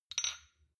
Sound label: shot glass clink soft; berufe; soundpool